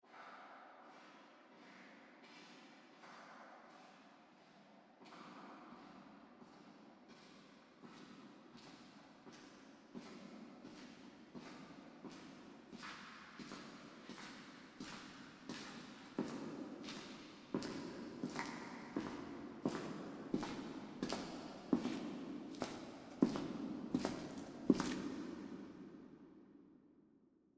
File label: Male Steps In Church Approaching With Reverb